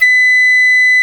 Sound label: Flute D#5